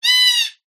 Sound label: animals bird cockatoo black squawk slight distance